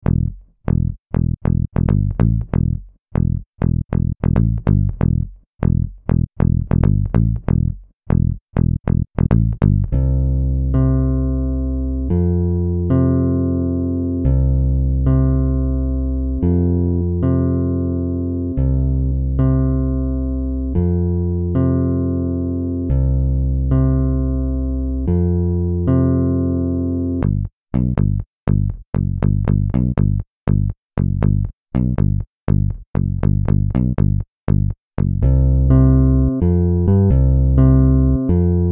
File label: precision bass